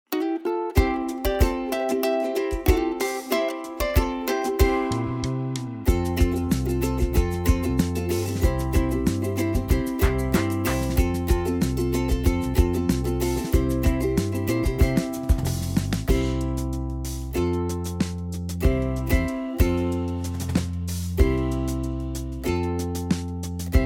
wersja instrumentalna

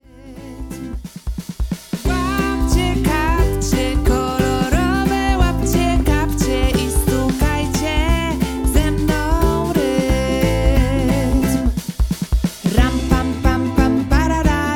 wersja wokalna

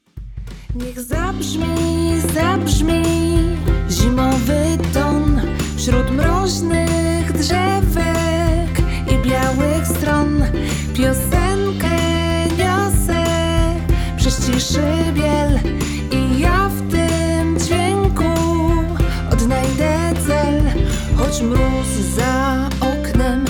wersja wokalna

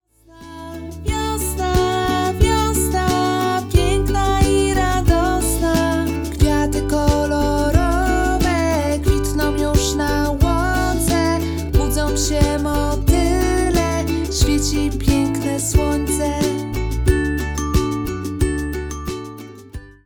wersja wokalna; pakiet muzyczno ruchowy wiosna

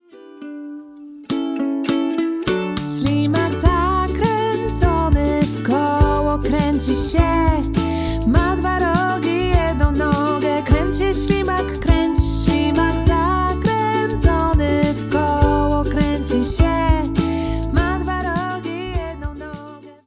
wersja wokalna; pakiet muzyczno ruchowy wiosna

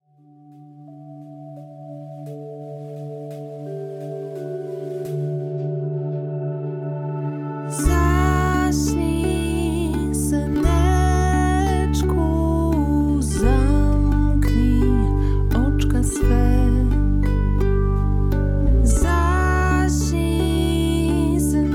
wersja wokalna

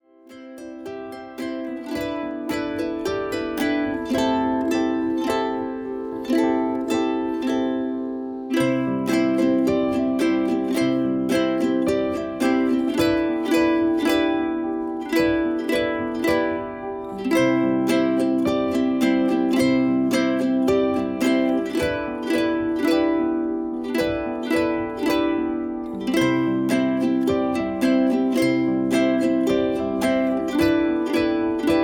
wersja instrumentalna

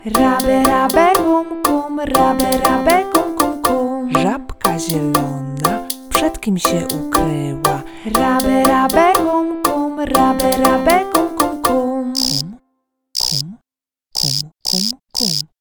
wersja wokalna

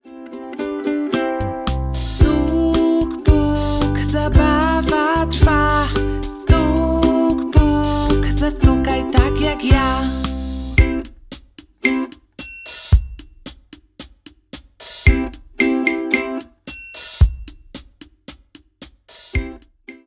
wersja wokalna; pakiet muzyczno ruchowy wiosna

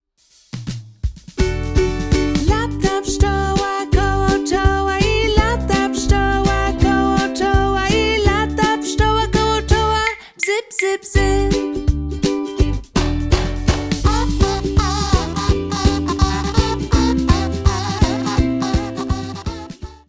wersja wokalna; mini pakiet

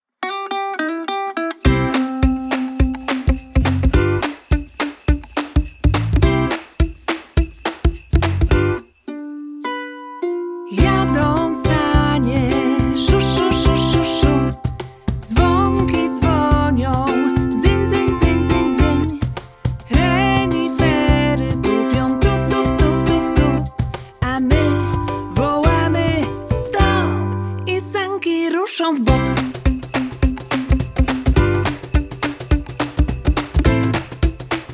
wersja wokalna

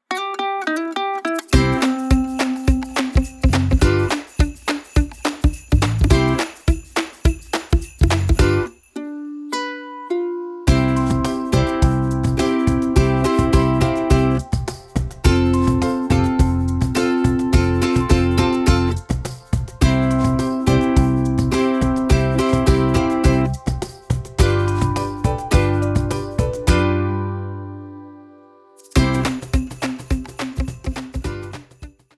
wersja instrumentalna

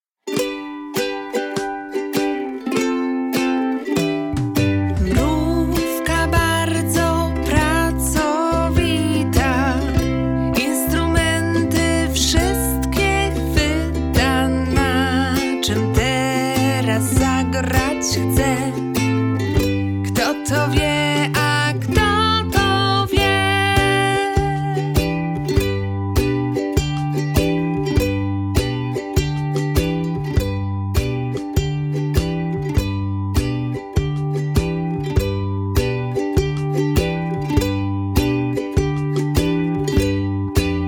wersja wokalna bez zapowiedzi i instrumentow

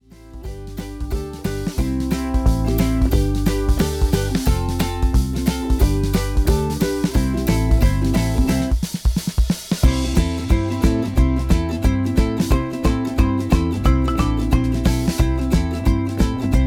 wersja instrumentalna

